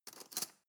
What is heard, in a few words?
cutlery teaspoon drawer grab